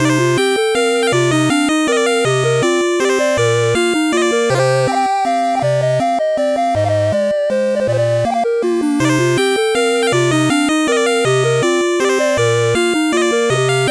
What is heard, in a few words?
8bit bgm normal